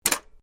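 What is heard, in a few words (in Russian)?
zvuki cd-pleera, CD player sounds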